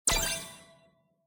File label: tweet received; FightingGames; TWBlue